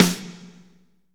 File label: big band fast; Roland L-CDC-01